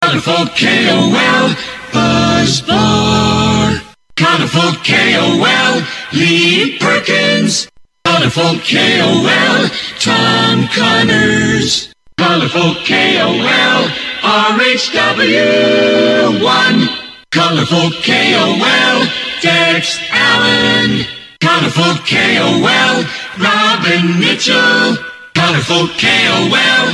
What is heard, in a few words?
JINGLES; KOL; redub